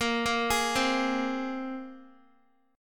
A sharp 7th Suspended 2nd down Guitar 4F 4A 5C 5G 5C 6E; A#7sus2; arpeggiated